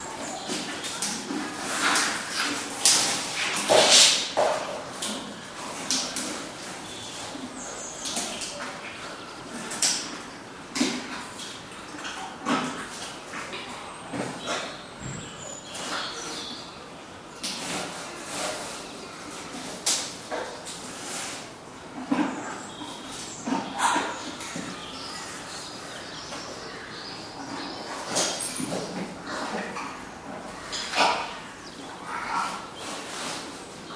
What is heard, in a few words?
rhino eating twigs indoors